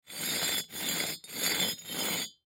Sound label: Brick Slide Move, Brick Slide Scrape Cement; Foley; Objects; Blastwave